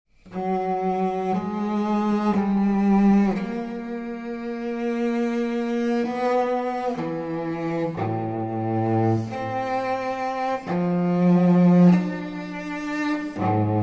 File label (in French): Violoncelle Baroque et Clavecin